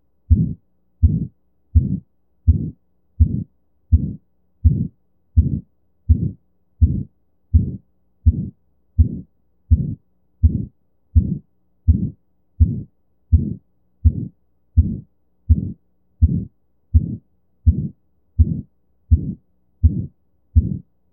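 Aortic, Systolic Murmur & Absent S2, Sitting, Bell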